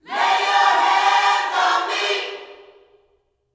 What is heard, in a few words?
LAY HANDS, Gospel; Spectrasonics; Vocal Planet; GOSP PHR